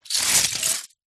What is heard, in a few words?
leisure lego pile movement